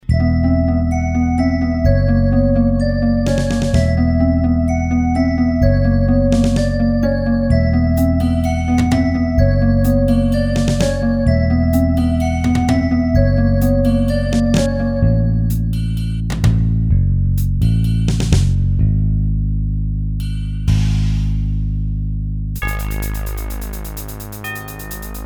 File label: midi, Midi Gm by Roland Jv2080